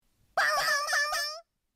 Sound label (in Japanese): パフパフ！